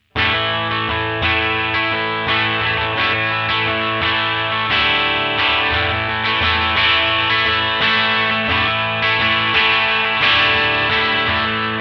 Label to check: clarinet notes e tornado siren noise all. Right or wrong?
Wrong